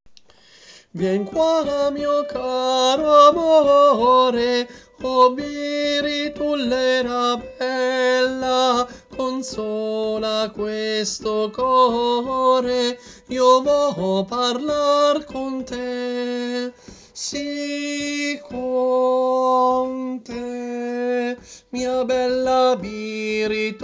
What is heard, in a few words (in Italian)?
Tenori Biritullera; Coro Isola del Giglio